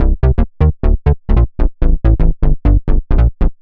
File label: Bass, uk garage, 132bpm